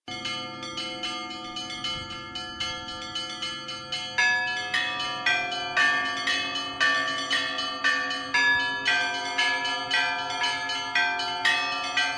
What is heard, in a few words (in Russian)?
Рождественские колокольные звоны